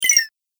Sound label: Lose Health Game Fx; Video Game Sound Effects